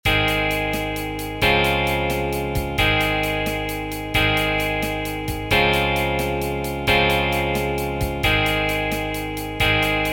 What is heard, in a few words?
6/8 Blues No Piano; Tryout track; 2027 MMEA conference All-State Collective Ensemble audition